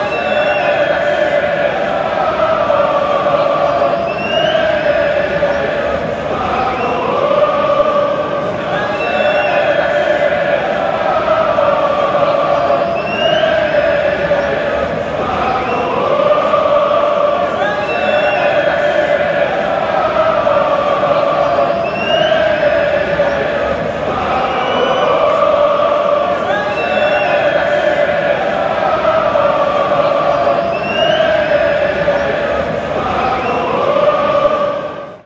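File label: barrow fc chant, football songs and soccer chants; united kingdom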